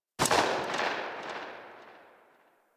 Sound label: Pistol Tail Ext; Weapons; Warframe; Tenno; Guandao